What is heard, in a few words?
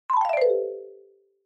Xylophone Fast Long 1 Down